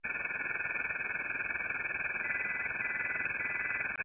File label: Beeps 52dB